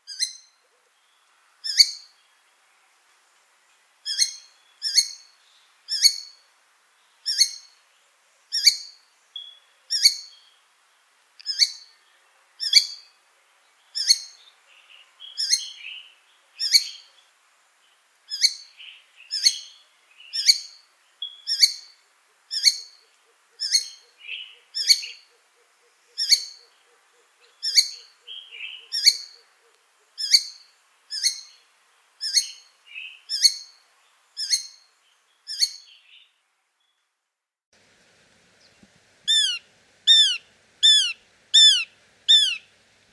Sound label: Shikra Sound